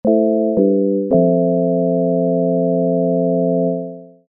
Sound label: Wavetable; Sweetwater Creating Great Sounds; creating great sounds in your daw